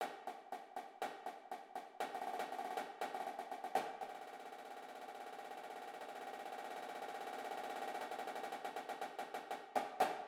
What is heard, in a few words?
cadence snares, drumline